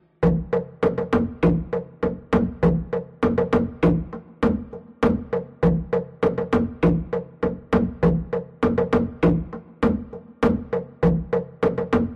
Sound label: african slit log drum